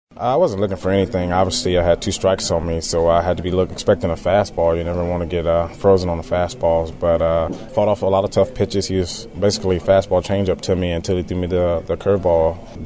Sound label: SWEEP